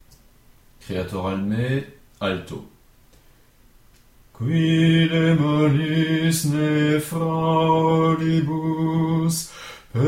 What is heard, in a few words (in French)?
Creator alme alto